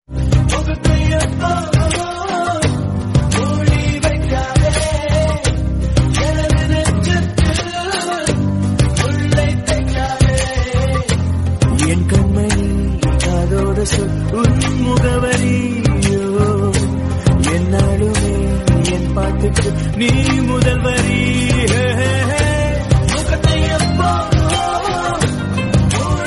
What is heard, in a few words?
Tamil Song Ringtone